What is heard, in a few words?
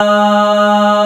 CHORUS G#3; CMP - Analogon; TREMOLSKI